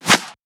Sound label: Shifted Clap; FLPRO